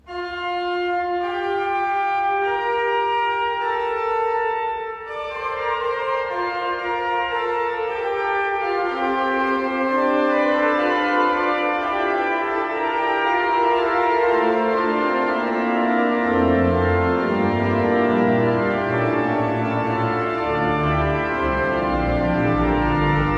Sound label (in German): Orgel; Koethen Feith Orgel Springstrasse; denkmaltag